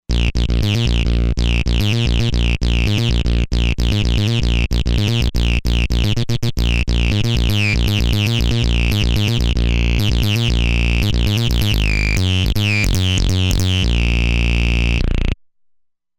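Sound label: Lead Acid Saw, synth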